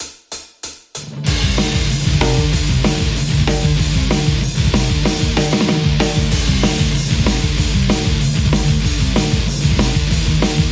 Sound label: Les Paul; metal